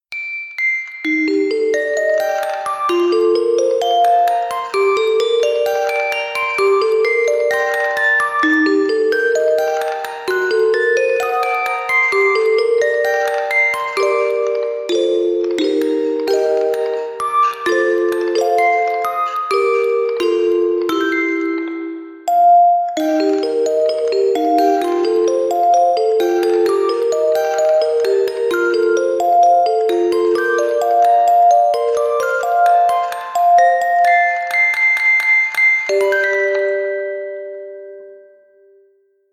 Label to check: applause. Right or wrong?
Right